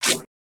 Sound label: Futuristic Weapons Sound Effects